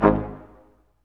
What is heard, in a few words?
BRS Quintet stac; Roland L-CD702